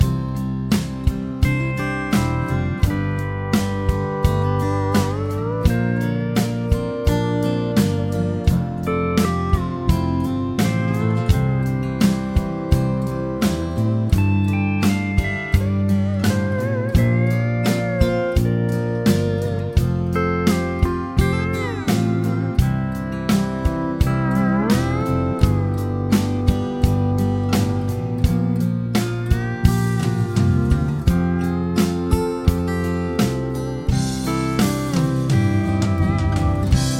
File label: chord prog with mixture